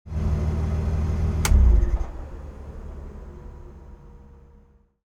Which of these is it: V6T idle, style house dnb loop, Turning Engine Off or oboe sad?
Turning Engine Off